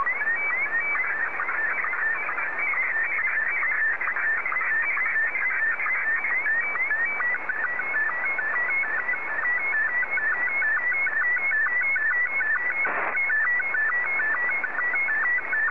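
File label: Martin 1, slow-scan television; JA3QJQ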